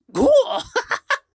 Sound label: Lunge; Collector